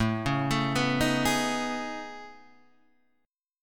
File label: A Major Sharp 11th, Guitar, Half Step Down; AM#11